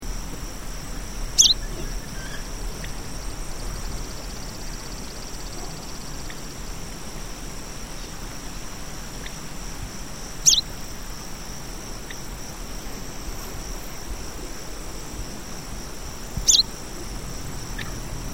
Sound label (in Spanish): cachirla chica, aves